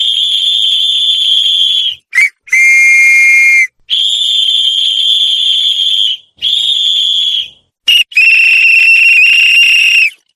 gym workout; Ambient

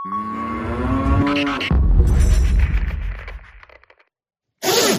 footsteps concrete; Foley

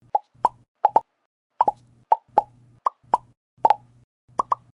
Thumb Bubble Pop

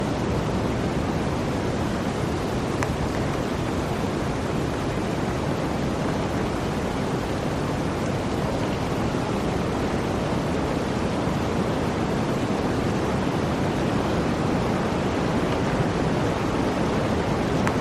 jungle ambience; Ambient

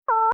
Vocal Censor Beep

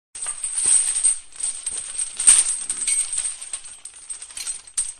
chains rattling; Horror

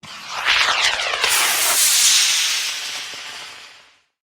multiple rocket launch; SFX

